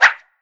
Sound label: Hit Miss; Skill Hit Miss